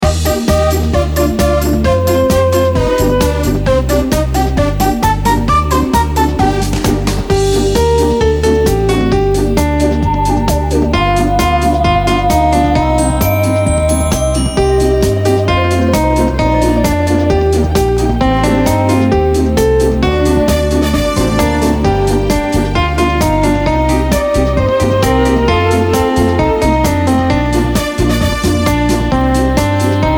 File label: karaoke